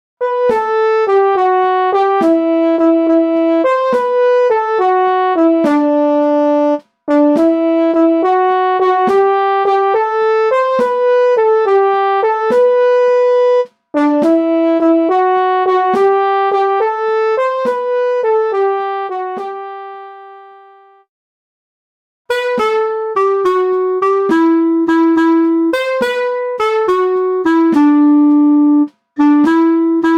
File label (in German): midi heimkehr 1-stimmig